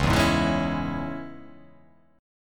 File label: C sharp Minor